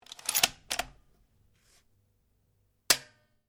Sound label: Rifle Remmington 700 7.62 mm Bolt Action Dry Fire; A selection of domesttic firearms; Rifles